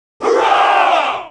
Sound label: hurrah